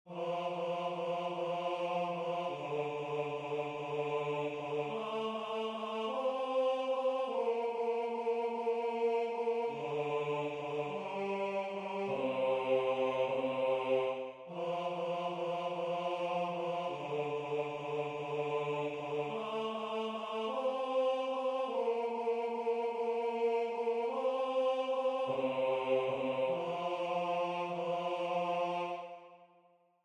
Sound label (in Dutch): Bas; Kind ons geboren